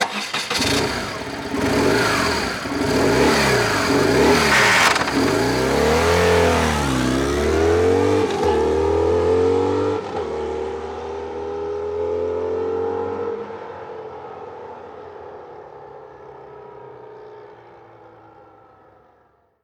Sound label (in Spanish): moto arrancando